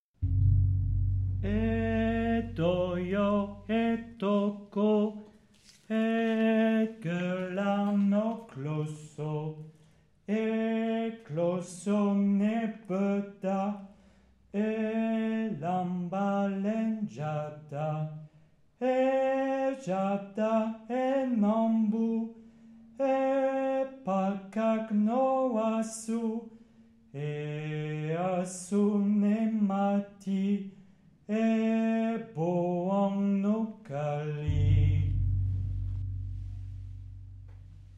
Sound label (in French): chant